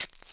pavement stop, footsteps

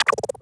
hit chirp, droids robots

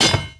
hit rock; impacts explos

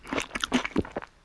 Eat

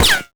hit; Max Payne

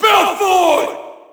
Shout, audience; UFC Tapout 2

